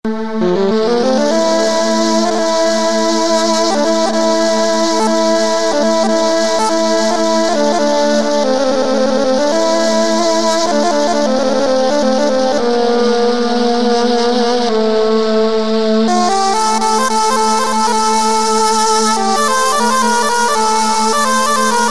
ney

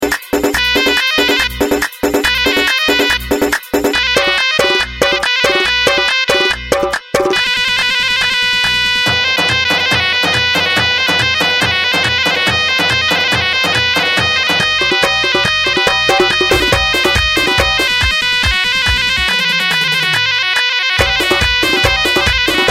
kordi

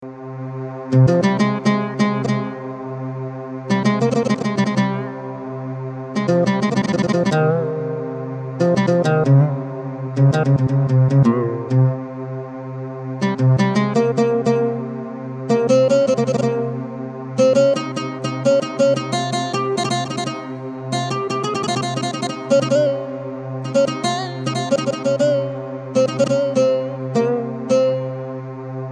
saz; انواع صدا برای ارگ

